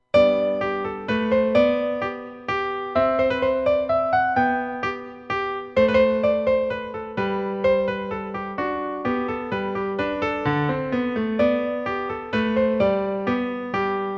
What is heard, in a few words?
MENUETT, piano; piano favourites